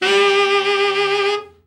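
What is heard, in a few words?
TENOR overblow, Sax, FALL; Giga Samples Collection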